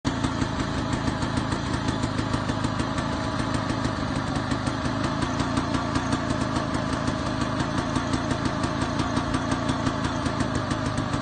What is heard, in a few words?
Vespa 180 SS